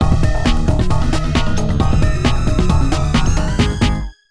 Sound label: Sting End Mission; Cockroach; Monsters vs Aliens; NDS